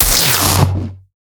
gun sound design blaster shoot electricity

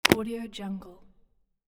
container close

